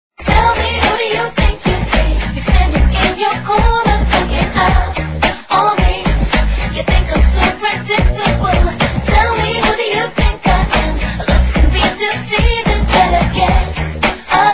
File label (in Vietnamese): nhac quang cao; doublerick